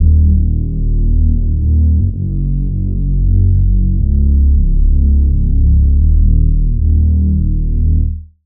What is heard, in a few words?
Reese